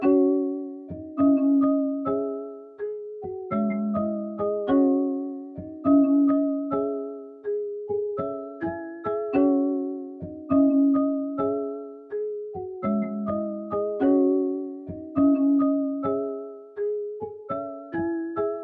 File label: West Coast Melody Loop - 103 BPM C# Min Marimba; Melodies; Loop Stems; Cymatics; Oracle Sample Pack